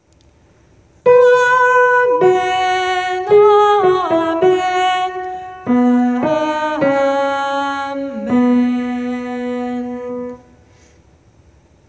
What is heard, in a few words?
amen soprano